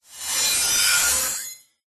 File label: science fiction robot manic glitch psycho